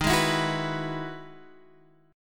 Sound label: E Major 9th Eb, chord